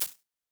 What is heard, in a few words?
Hit Hat